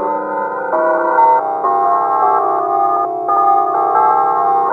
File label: WIND BELL G1; Big Fish Audio; Synth City